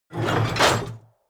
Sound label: chest metal medium close